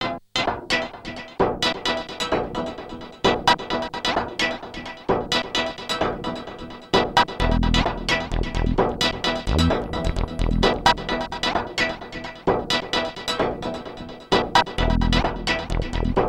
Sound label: ensoniq mirage dsk-8 sounds, external fx